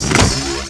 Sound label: Door Locked (Low); Souls in the System